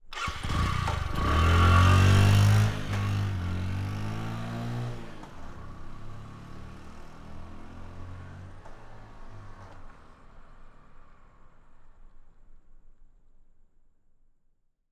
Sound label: Motorcycle - Electric Start - Fast Speed Pull Away - Indian; The AudioVille; Motorcycles of India